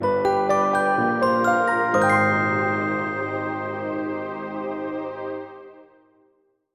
Bgm Jingle; etrian odyssey v 3ds gamerip 2016

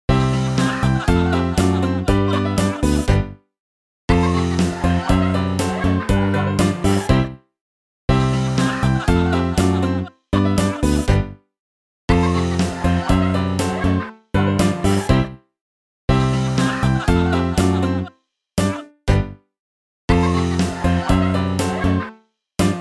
Cointoss Music

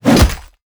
Fight Attack Hammer; Mighty Party; Android

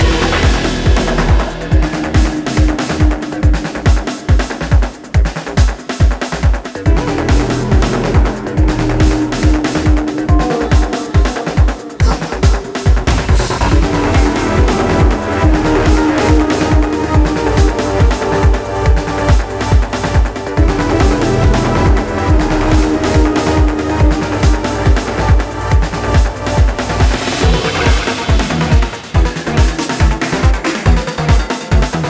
Boss Battle Main Loop Layer; Glitch's Trip

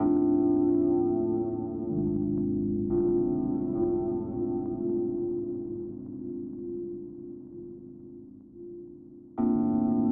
Soundscape; The Chant; OutTake Soundscape

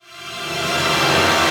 Mushroom Stinger B Short 1500ms

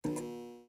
Substation One shot

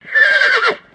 Whinny

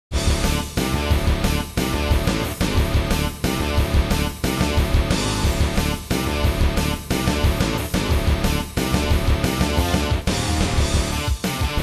Race BGM; Motocross Trial Extreme; android

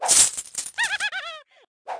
super continue

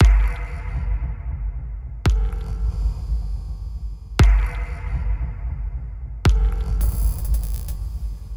Accusation Tension Loop; murder mystery machine; ps4; xbox series xs

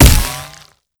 bullet hit rock

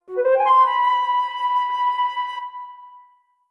flute

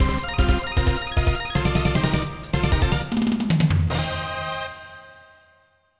Scenario Clear; Nectaris; PS1

